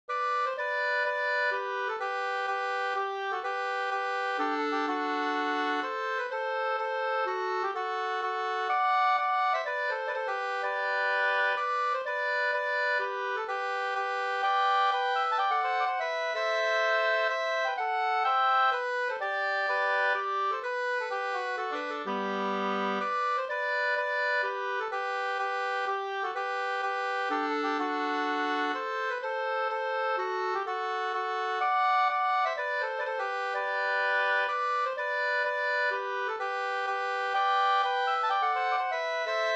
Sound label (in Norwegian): pols Rorospols; e Olle Dahl 2st